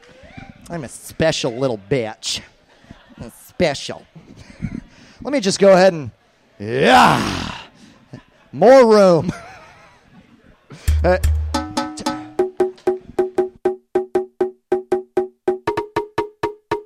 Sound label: The Mortgage Chant; Live Performance Stream 2018-07-08